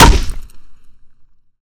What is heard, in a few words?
Bat Hit, Melee; zps; Weapons